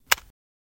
Fuse Box Sml Switch; switches

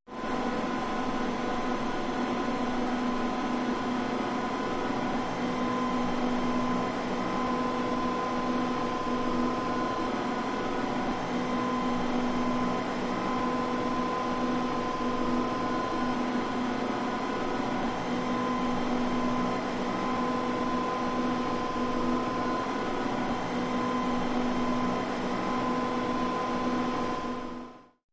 Airport Control Towe

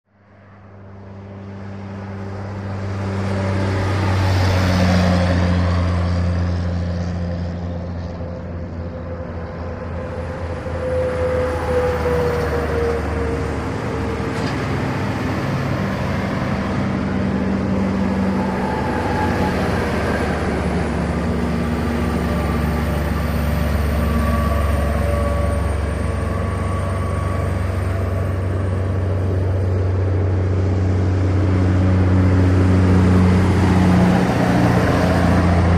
Truck Traffic On High; Truck Traffic On Highway, Close Bys, Tire Whine, Close Point of View.

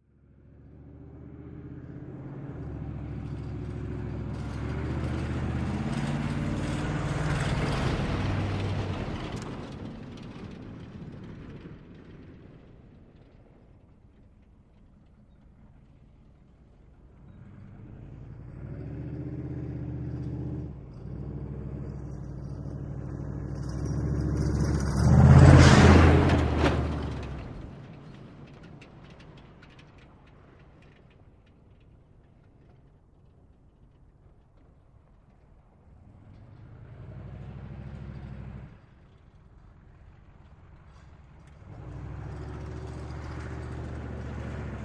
Humvee Var Driving Ma

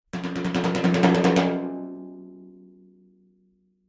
Timpani Hands Speedy; mallets timpani

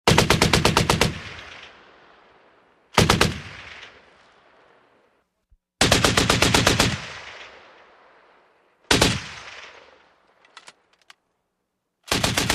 Mach Gun 50 Cal Shot, 50 caliber exterior

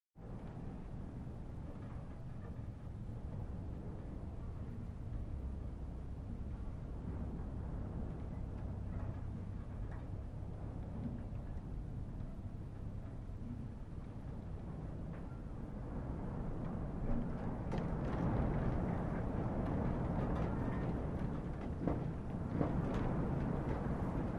Shack Wind 1 Dilapid, int & rattles

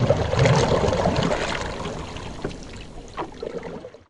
Canoe Paddle Stroke Underwater

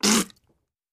Spitting Water; coughing & spitting

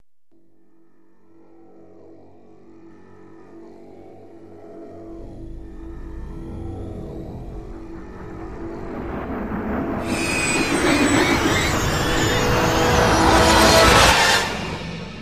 Starship By Buildup

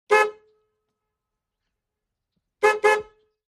Rover Horn Beeps; horns ext.

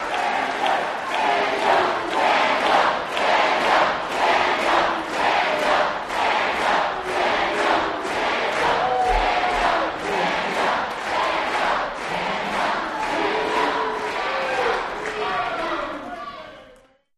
Crowd Chants

